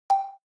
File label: Video Record, ui; SKY IM-T100K VEGA No.5